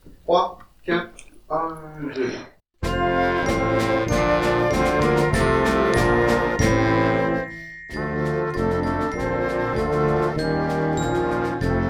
quand il neige defi noire a 96

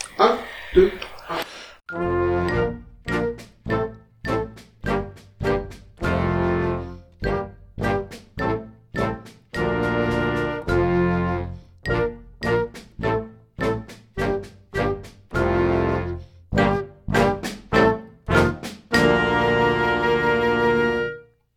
appat de souris noire a 108

